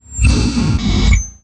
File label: Electric Weird Scratch